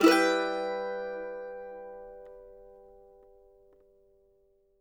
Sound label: mando min; Sampleheads; Whole Lotta Country 1 & 2